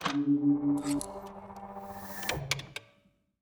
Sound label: piston